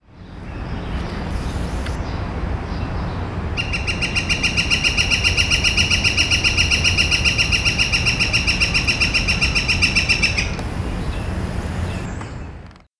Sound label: Colaptes auratus; C Park; NY